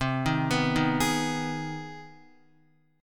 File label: C Minor Major 7th Sharp 5th C Guitar 4F,4A,5C,5G,5C,6E; arpeggiated; CmM7#5